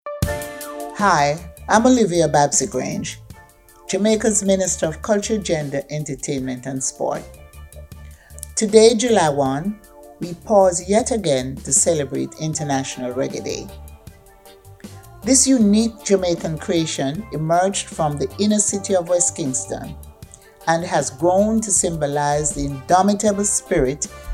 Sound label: Reggae Day